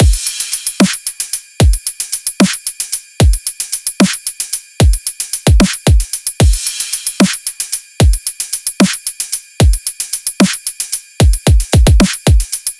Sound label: Dubstep Full Drum Loop - 150 BPM; Drums; Loops - Full